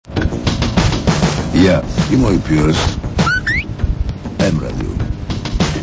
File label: jingle whistle